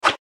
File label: slash; slice fruit